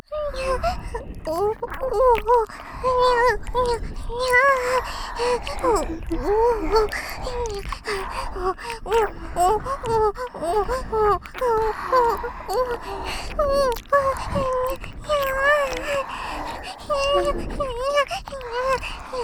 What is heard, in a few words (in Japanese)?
オホ喘ぎ＋両耳舐めループ 耳塞ぎver, ASMR